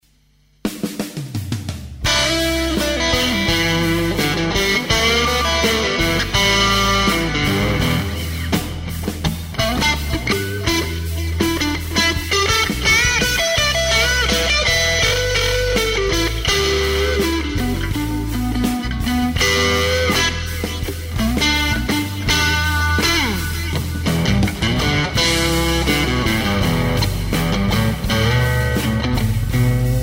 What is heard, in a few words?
Neck Marsh4